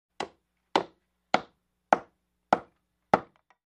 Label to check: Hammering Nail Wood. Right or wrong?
Right